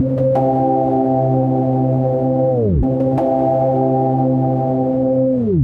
Drill Breeze, пэды

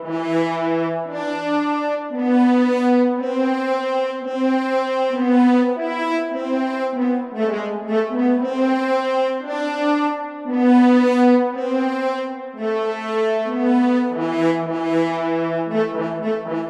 horns, средневековая мелодия

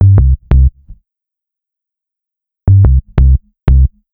Pick Up, бас-сэмплы хип-хоп

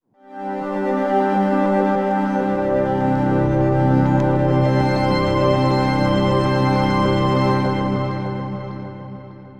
звуковая атмосфера pad сэмпл

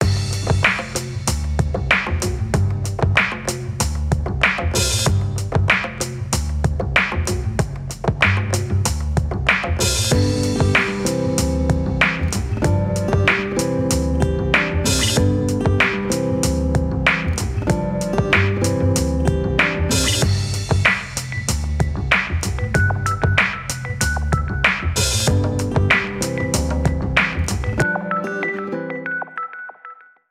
hip hop, Музыкальные подложки

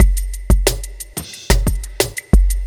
сэмпл ударных chillout sunset

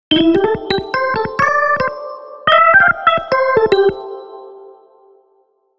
Solorg, орган

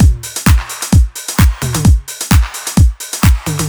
сэмпл ударных евродэнс

